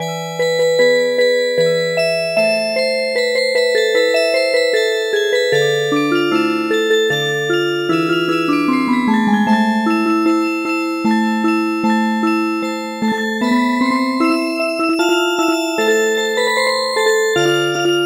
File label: Music Box, traditional